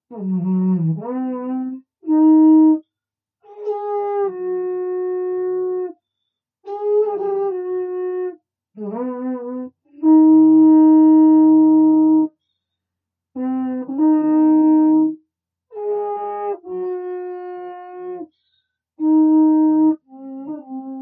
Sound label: alphorn